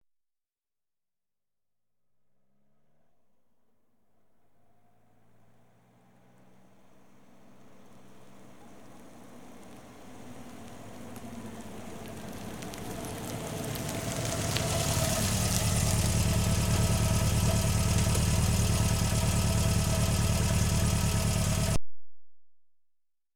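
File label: Ford Fiesta car approaching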